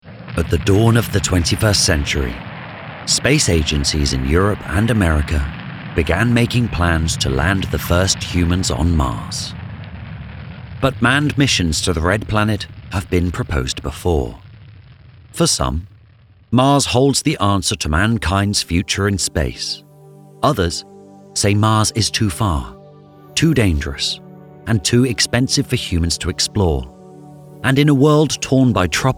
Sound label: Documentary Science Dramatic; VoiceOver artist, Narrator